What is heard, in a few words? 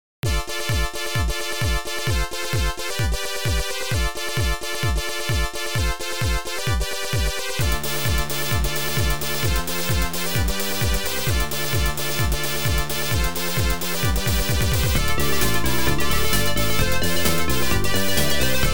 OPL2; Tyrian